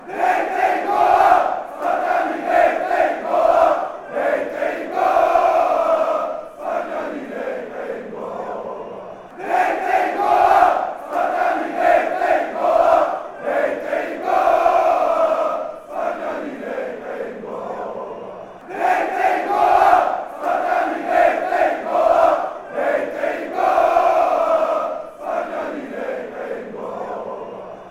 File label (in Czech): spartani dejte jim gola, fan chants